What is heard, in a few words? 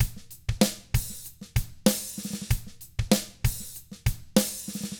HARD ROCK, Drums; 96HARD ROCK